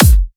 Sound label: kick, drum hits; Anthemic